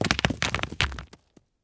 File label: golem die; propack